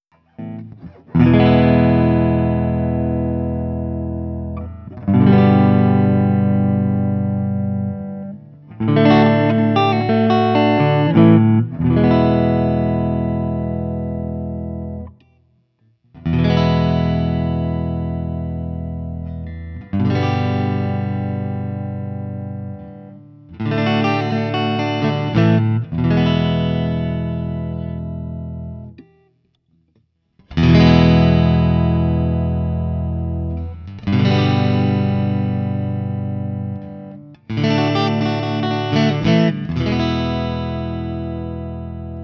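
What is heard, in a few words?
Open, guitar; Guild CR01 ODS100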